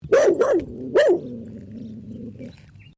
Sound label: ANIMAL DOG BLUE HEALER BARK GROWL; Various domestic dogs; Animals